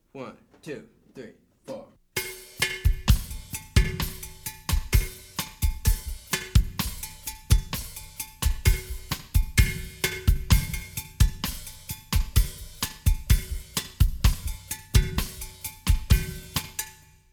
cha cha cha variation

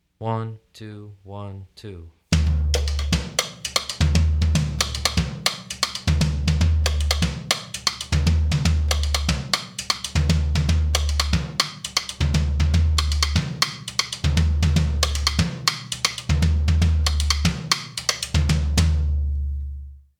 merengue apanpicho 3rd section

